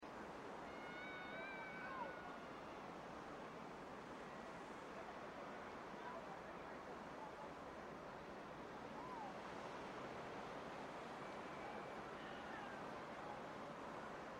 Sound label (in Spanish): CAMINO DESDE EL MORRO SOLAR HACIA LA CRUZ EN CHORRILLOS